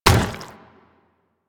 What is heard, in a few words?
Zombie Run Metal, Footsteps; Warframe; Infested